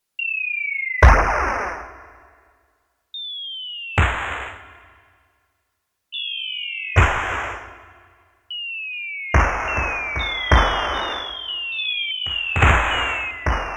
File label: Hoedown Fireworks